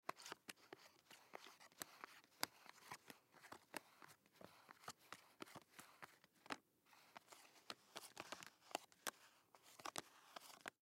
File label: Cards Deal Around Poker Table; SPORTS; Cards (Sound Effects:Casinos)